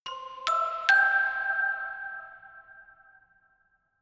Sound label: notification glockenspiel creepy triad up major